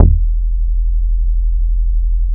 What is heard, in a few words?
paranoid bass, Analog & Synth techno; USB Soundscan vol.12; AKAI